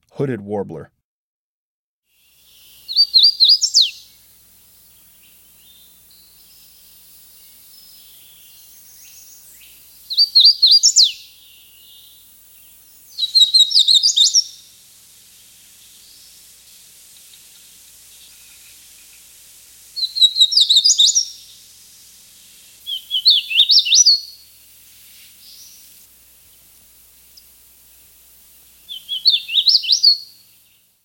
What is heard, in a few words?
Hooded Warbler; Breeding Birds of the Chicago Region